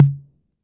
606 tom, drum machines; 606 Basic

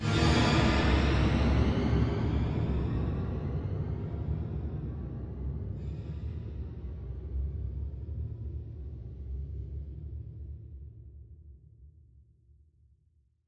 far off filter chord hit; Electro and Synthetic

